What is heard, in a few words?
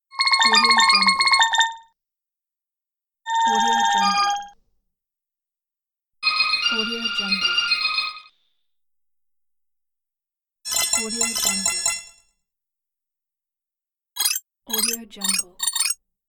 playful cellphone ring tones